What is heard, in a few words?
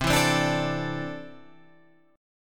C 6th Add 9th C down Guitar Standard; strummed; JGuitar